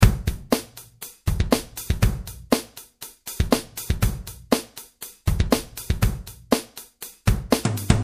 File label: Slam Kit